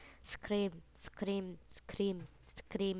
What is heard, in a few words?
scream